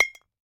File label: glass bottle clink; foley